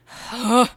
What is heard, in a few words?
Exasperated Huh